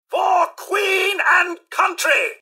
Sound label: generic robot attack; mrhandy; npc